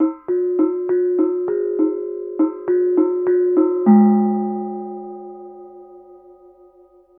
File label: KENONG; Spectrasonics; Heart of Asia; KENONG PH